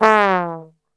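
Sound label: A 1 trombone; Best Service; Brass Super Section; 90 s Sample CDs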